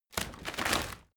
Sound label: rotten wood break